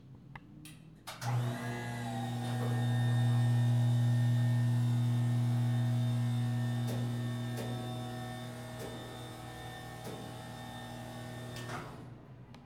Elevator

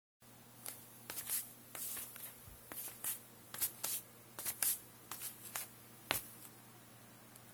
Steps

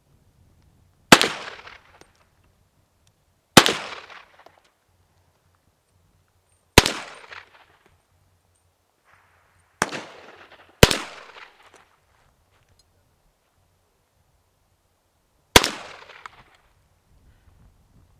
M1 carbine

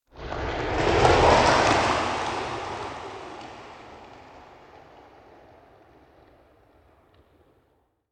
Passing Twister

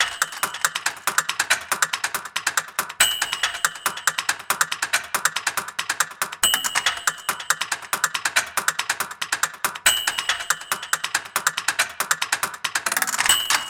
Typewriter Loop

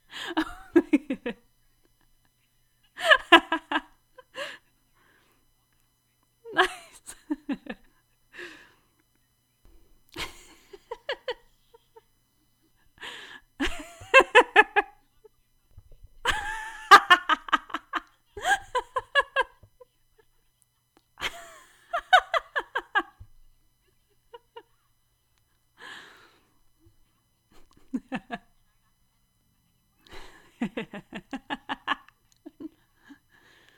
Laugh

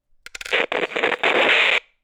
Cracklebox Crunch Ring Dropped Onto It

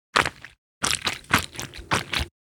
Squishy Foot Steps

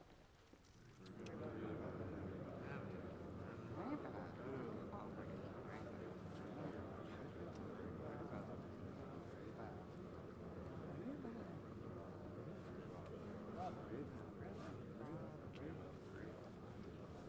Crowd murmur

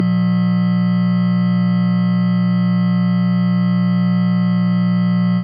60hz Electrical Mains Hum

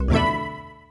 Magic

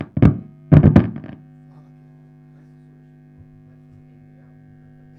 Plugging In

